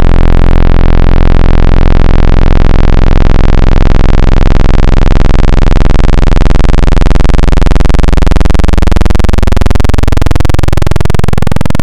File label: Analog PWM Square